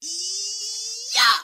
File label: "eeeeyah", voice; ooh ahh